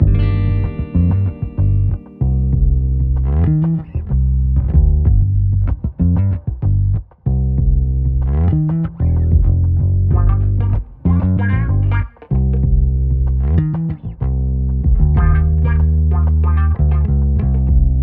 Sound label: Duck Funk; Escape from Duckov